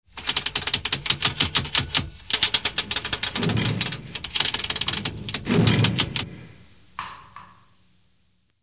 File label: Drawbridge; Halloween; Hallmark Halloween 1989